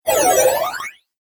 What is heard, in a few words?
Game App Magic Attack, Video Game Sound Effects